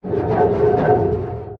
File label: damage vehicle; World Of Warships